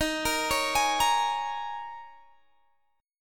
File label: Bb Minor 7th, Eb; arpeggiated